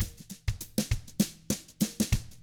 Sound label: FUNKY, Drums